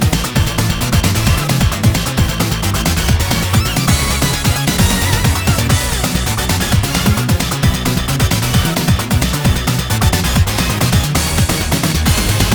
Stage Loop

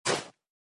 Snow hit

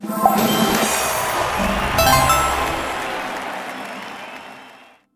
UI Advisor Wheel Reveal

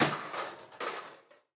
metal break; teardown ps5 windows xbox series xs gamerip 2022

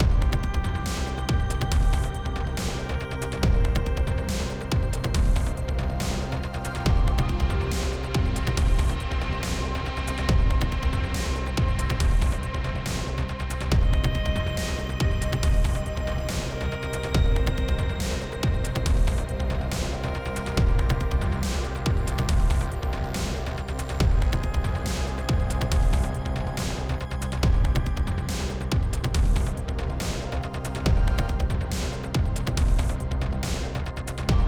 Combat 8CH 140BPM; Downtown Act3

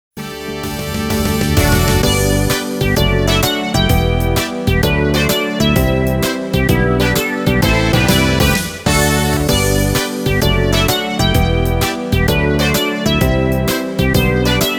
Success Autumn BGM

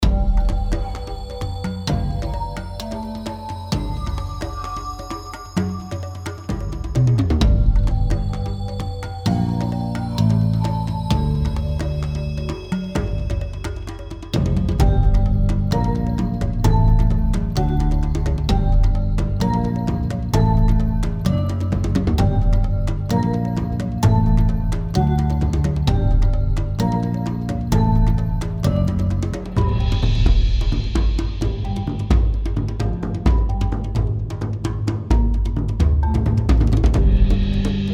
BGM dark Cave; witch spring 3 android ios mobile gamerip 2017

